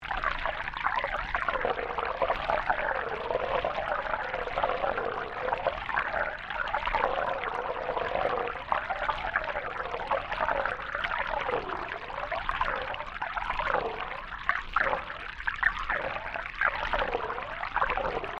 Underwater Boat Wake, Hydrophone dragged behind moving boat recording wake; Boats (Sound Effects:Vehicles)